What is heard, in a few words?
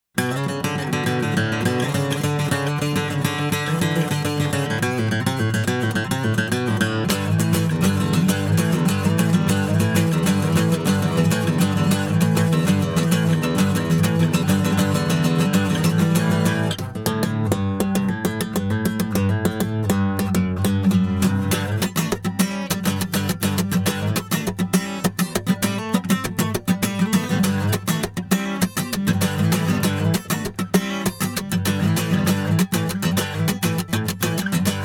F Guitar Jam